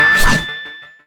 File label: Character Defence